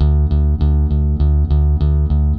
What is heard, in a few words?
BASS, ROCK POP; MAGIX